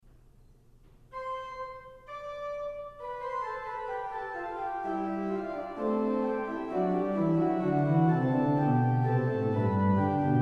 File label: Short Fugue, organ